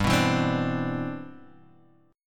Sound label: C Major 9th, G, guitar chord